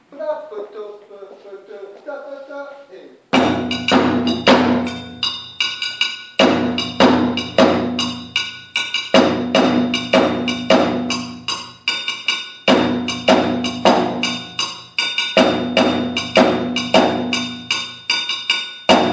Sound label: Dunumba